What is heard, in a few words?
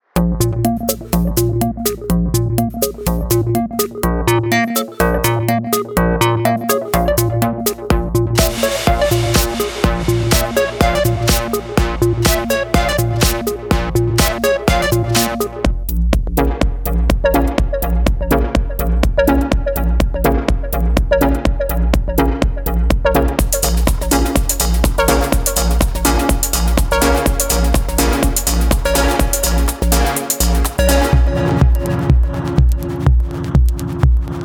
Tech House Deep; RS Massive; Tech House 1